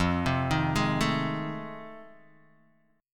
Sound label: F 7th Sharp 9th, Guitar, Open D